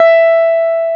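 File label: Gibson 335 E5, GUITAR; AKAI S-Series CD-ROM Sound Library VOL-8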